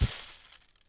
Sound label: Animal Foot Large Sand; Civ4 warlords